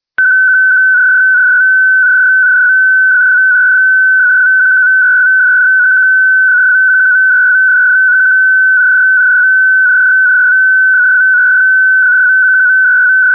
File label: FSK Hell 105; hamradio; digital communication modes; Digimodes